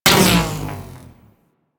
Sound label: Mag Pull Grapple On; Warframe